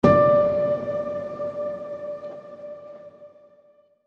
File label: Hushed Light Piano Ping